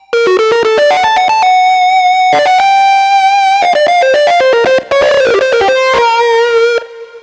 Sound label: Grunge Lead; Riffs & Solos; Dr. Fink's Funk Factory